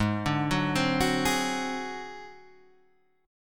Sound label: D 7th Flat 5th G sharp